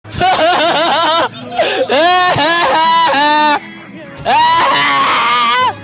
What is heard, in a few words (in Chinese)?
笑声; 电棍